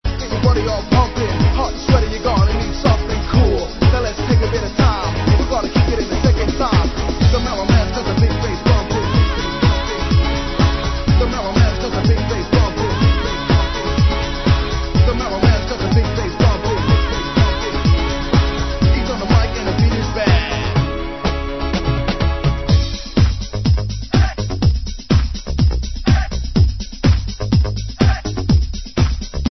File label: get the big bass; bass bumpers